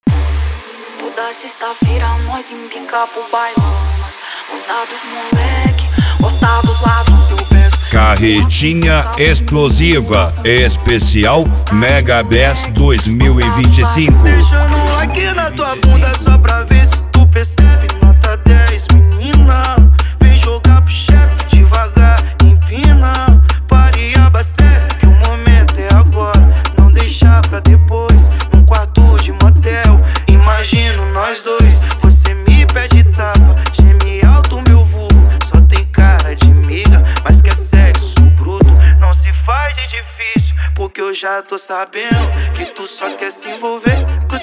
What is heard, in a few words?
mega bass